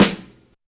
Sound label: small reverb snare drum sample g key